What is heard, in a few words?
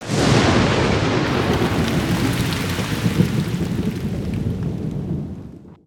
Thunder Storm